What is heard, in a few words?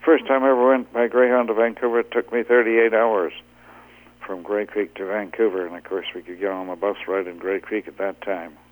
Tom